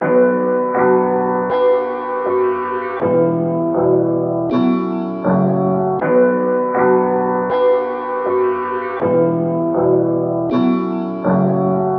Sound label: type loop; uribeats